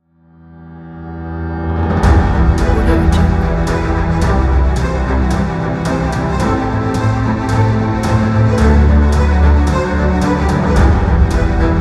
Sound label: action trailer